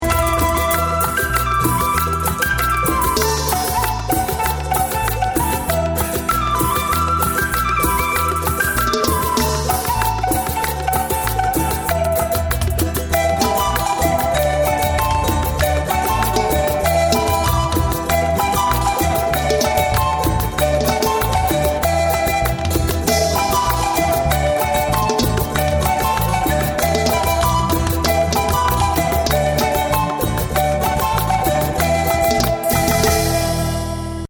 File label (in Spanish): cumbia